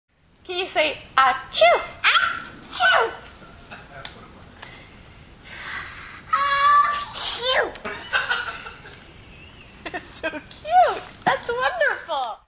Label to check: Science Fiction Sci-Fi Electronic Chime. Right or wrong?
Wrong